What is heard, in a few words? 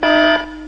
alarm evac, alarms; GregTech New Horizons; OpenSecurity